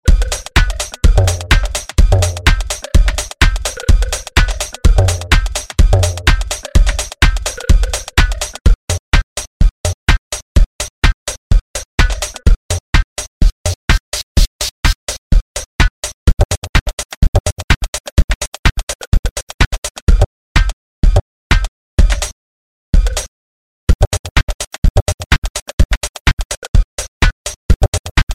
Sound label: FX Gater; TRAKTOR PRO 2